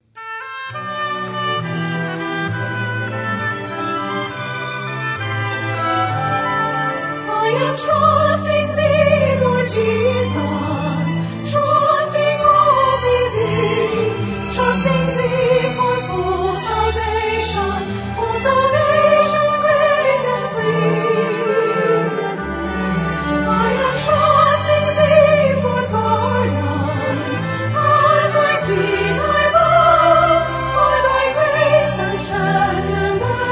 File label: Singers And Orchestra